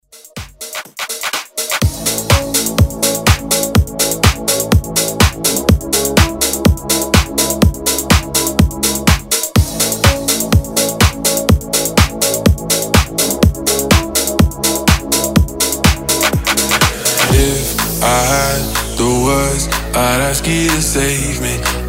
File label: house redrum